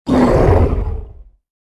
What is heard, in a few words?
Groan; Barotraumatic; CthulhuintermediateWild